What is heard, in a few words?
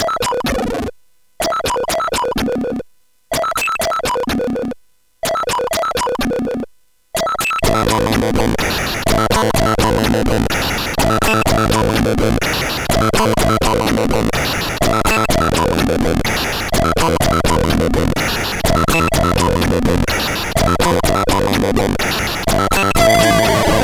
Just So Cool sid CSG8580R5; hvsc